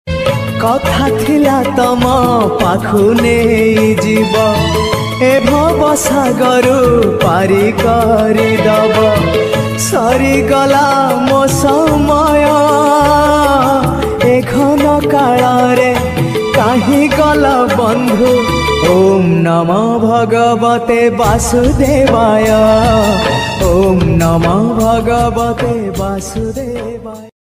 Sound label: bhajan ringtone odia bhajan; jagannath